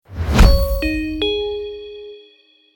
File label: Corporate ident effect intro podcast; logo animation